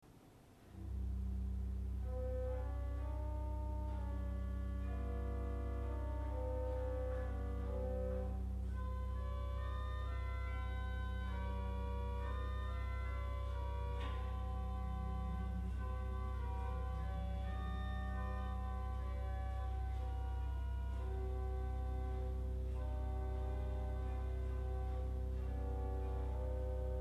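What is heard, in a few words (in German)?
Salicional 8, Orgelstimmen; Orgelstimmen CD1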